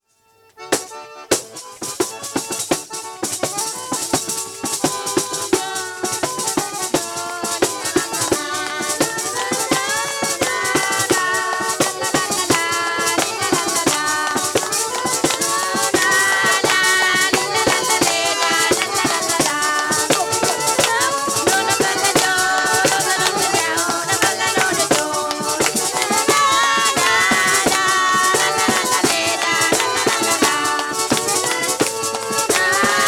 TARANTELLA; calabria

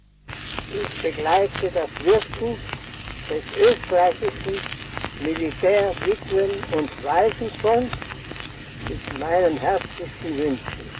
tedesco; 78giri

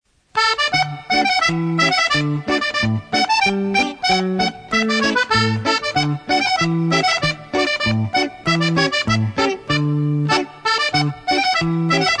contraddanza; BALLI SALTATI E NON DALL'APPENNINO BOLOGNESE

